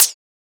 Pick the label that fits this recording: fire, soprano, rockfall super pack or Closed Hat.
Closed Hat